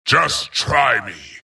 death long; dota; skeleton king

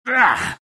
riki pain

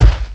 large, footsteps

